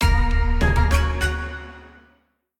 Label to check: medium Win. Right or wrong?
Right